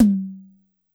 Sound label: Jazz Tom 6, Yamaha PSR-E323 Symphony Kit